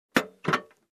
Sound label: Construction